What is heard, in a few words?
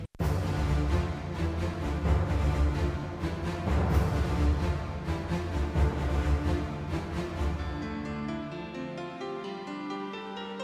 rain song, ringtone